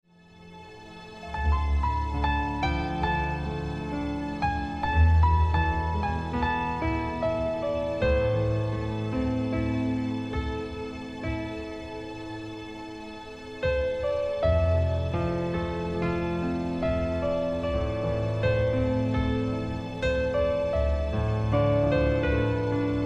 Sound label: 432 Hz